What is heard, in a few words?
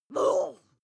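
witch groan short, creatures; kreaturen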